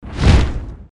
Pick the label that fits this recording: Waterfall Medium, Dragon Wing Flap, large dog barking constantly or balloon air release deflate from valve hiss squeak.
Dragon Wing Flap